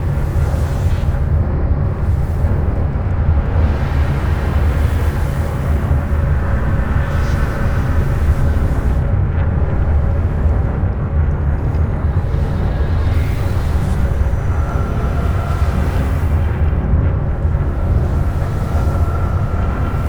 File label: science ship hum; nemesis; Stellaris